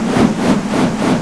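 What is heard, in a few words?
ambience fan; cruisecontrol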